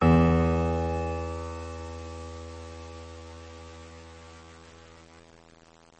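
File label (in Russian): пианино одиночный орган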